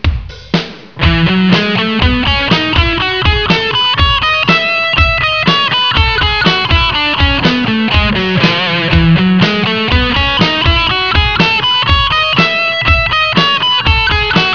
e maj 12, progressions scales guitar

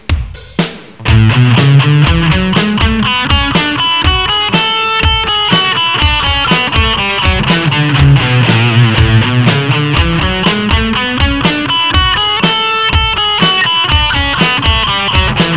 a major 5, scales

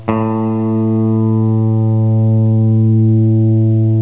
tuning, guitar

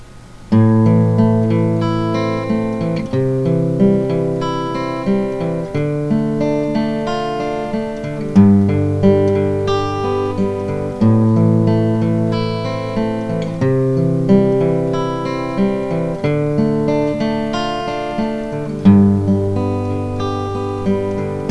picking, guitar

